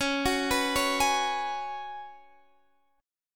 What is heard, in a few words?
C sharp 7th Suspended 4th C sharp, Guitar Standard; C#7sus4; arpeggiated; JGuitar